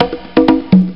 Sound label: kongas